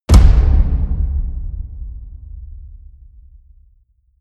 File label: drum hit hollow medium; percussion